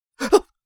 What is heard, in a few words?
Male vocalization surprised sigh gasp and inhale